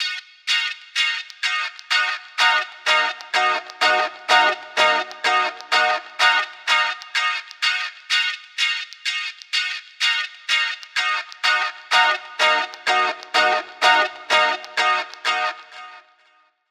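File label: Freeze gtr, guitar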